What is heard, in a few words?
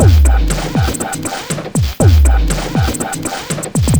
Processed Beat, Drums & Percussion